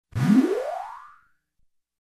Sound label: Bubbly Rising Sweep